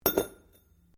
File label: METAL ON STONE IMPACT GENTLE; Materials